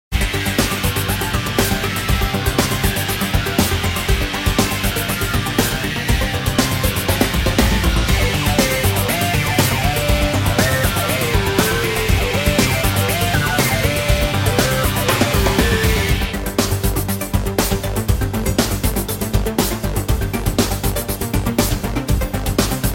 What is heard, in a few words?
Hall Music; M.A.T. Mission Against Terror; MAT 1 Online; windows